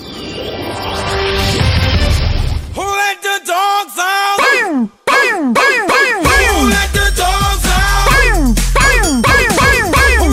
Goal Horn

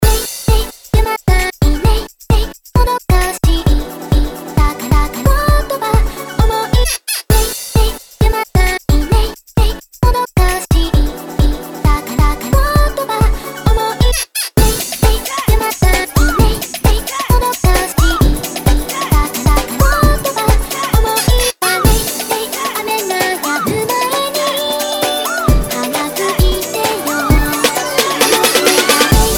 JerseyClub bootleg

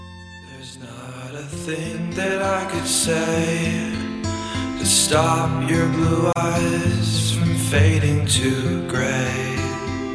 Smooth Jazz

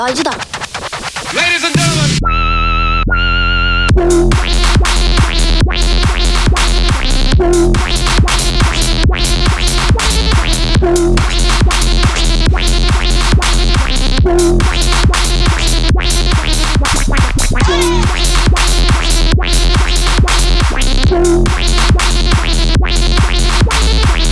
BASSLINE

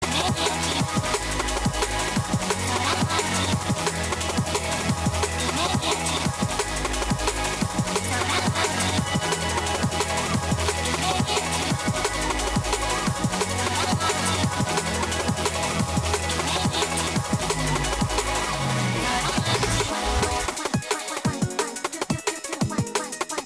DNB BOOTLEG

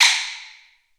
Taiko Stick

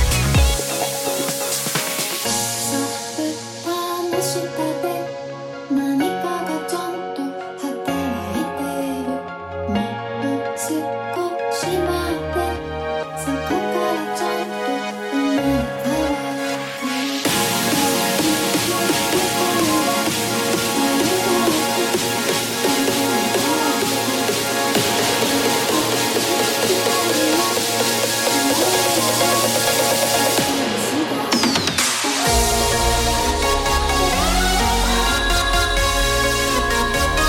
Progressive house Remix

